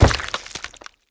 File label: flesh; Rust